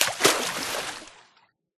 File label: splash, dolphin; mob; Minecraft